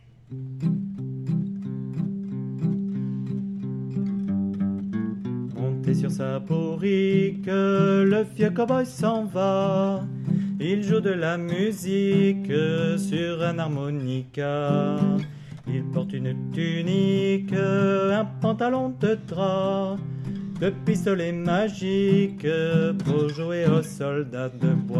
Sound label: Alto